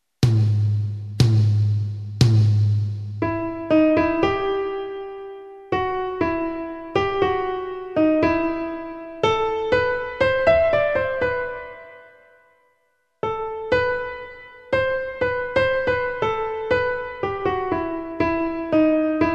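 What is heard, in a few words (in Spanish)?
dictado rítmico; conservatorio vitoria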